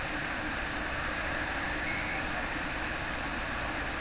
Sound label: BARN OWL Distant; ETNA NY